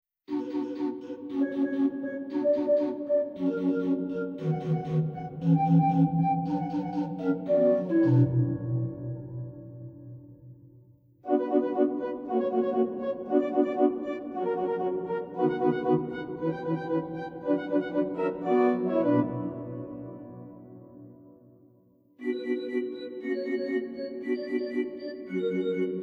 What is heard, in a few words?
Flutes